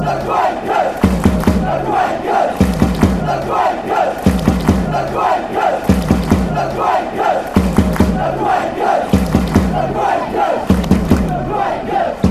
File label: quakers, fanchants